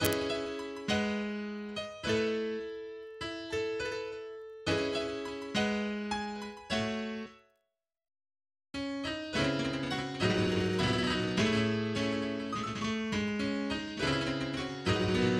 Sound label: Slime music MIDI